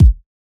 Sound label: Kick - A; Drums - One Shots; Hip Hop; Cymatics - 9God Hip Hop Sample Pack